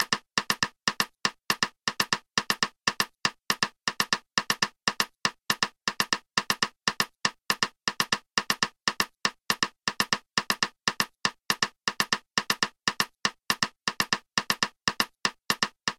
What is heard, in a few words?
guaguanco matanzas guagua